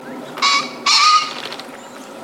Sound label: kiji